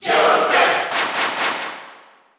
Cheer Dutch; Joker; SSBU

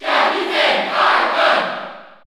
Cheer French NTSC; Captain Falcon; SSBU

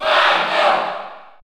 Cheer French; SSBU

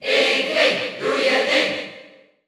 Cheer Dutch; Inkling; SSBU

